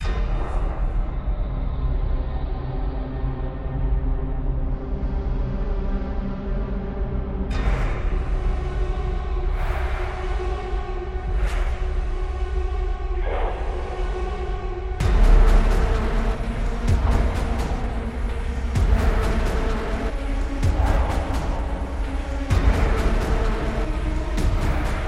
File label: Suspense